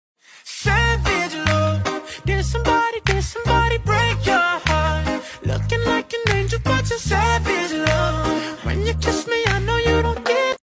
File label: Siren Beat Ringtone